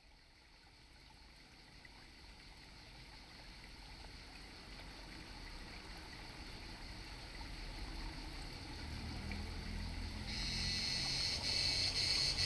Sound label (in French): CIGALE BINO